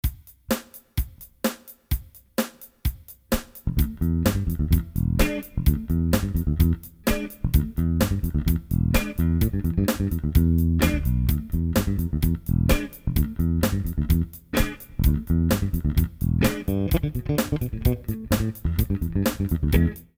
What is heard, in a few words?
groove